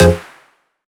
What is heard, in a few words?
Synth Shot; Vengeance Samples